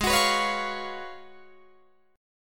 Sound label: Ab Minor 13th, Ab, Guitar, Drop D